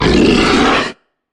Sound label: troll monster growl; Infernal Radiation